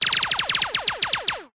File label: science fiction weapon gun silenced multiple shots fast automatic